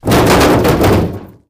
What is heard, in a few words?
Body Falls Car Hood